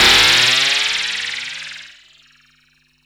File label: uprising short, fx & sweeps; EZRollers